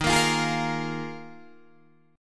D sharp Suspended 2nd Suspended 4th D sharp Guitar Open D